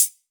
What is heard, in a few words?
HH, Drum Mashines; EdgeSounds